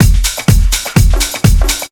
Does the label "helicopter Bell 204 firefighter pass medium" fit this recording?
No